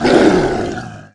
gorilla

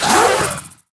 insect attack

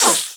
death, snake boss; 12zi snake boss

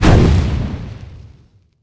spell archer vanish

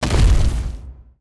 foot large

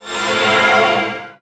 spell double shot cast; archer

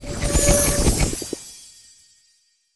spell detection; archer

